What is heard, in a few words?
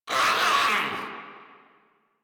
Infested Runner Attack Call; Warframe; Runners